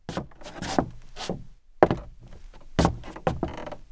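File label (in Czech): kroky; Mafie 1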